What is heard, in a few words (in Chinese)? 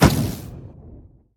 潜影贝射击声